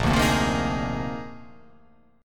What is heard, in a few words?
A sharp 11th